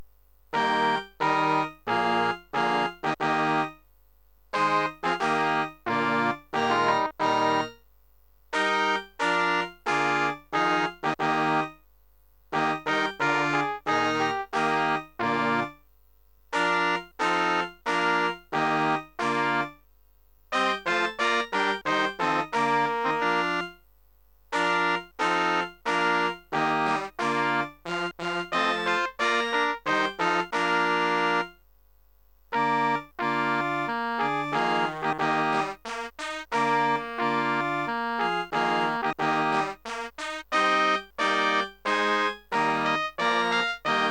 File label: MIDI, Tenor; MIDI med separerade stämmor